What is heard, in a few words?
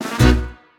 c near Win; willyshotchillies